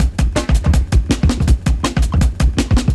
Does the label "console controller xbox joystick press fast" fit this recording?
No